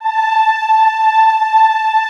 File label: Classical Choir, A4; Best Service; Extended Classical Choir